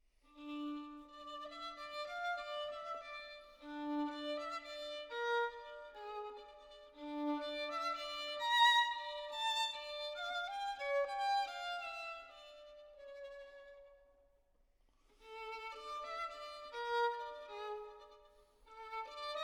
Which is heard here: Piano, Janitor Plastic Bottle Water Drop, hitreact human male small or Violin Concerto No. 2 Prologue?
Violin Concerto No. 2 Prologue